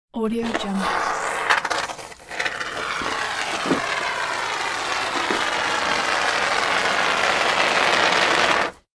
applying foam glue; industrial sounds

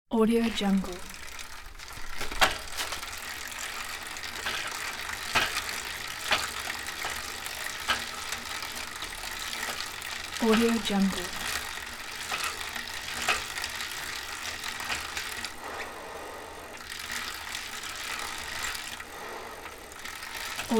bike pedalling close mid speed; urban sounds

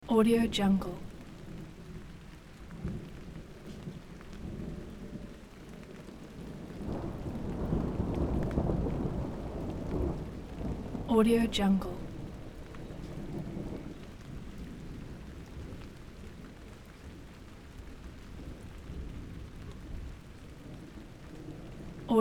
rain thunderstorms subtle rain thunder distant; رعد و برق در فاصله دور; nature sounds

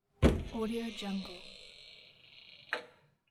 old squeaky balcony door open; domestic sounds

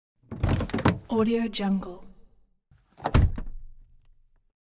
modern car door open close; urban sounds

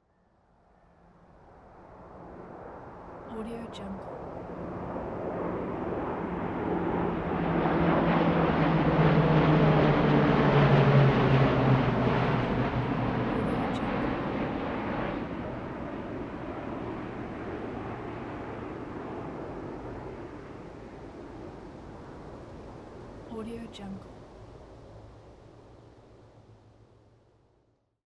four military propeller planes flying by

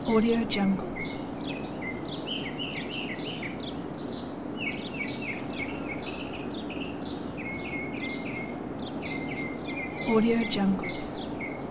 bird twitter morning urban ambience birds; پرندگان در هنگام صبح

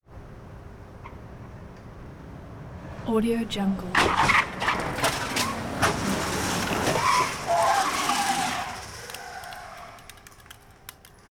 vehicle crash; urban sounds

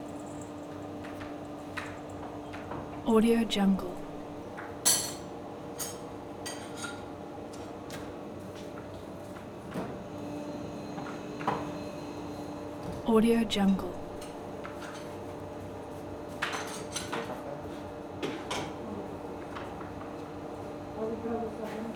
kitchen restaurant; domestic sounds

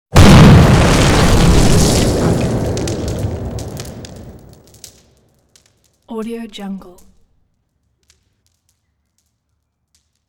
car park explosion

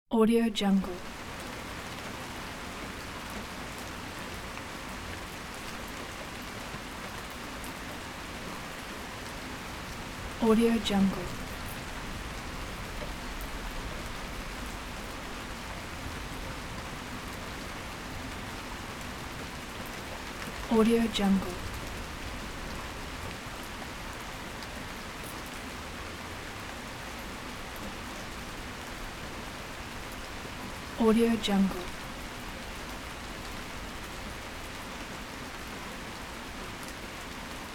rising summer rain; باران در حال شدید شدن; nature sounds

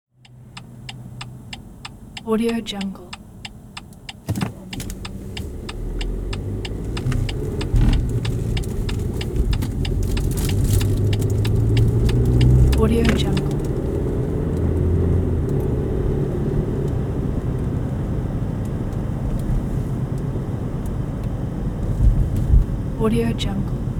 car signal blinkers idle driving; urban sounds

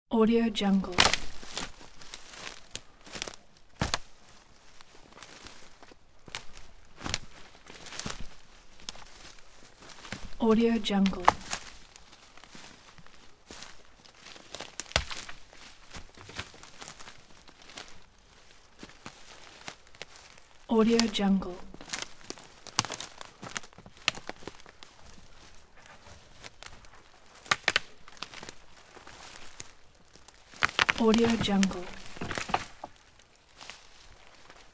walking through brushwood in woods; human sounds

